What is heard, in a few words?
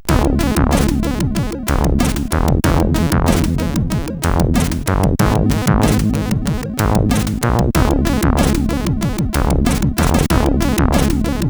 Loop, sid CSG8580R5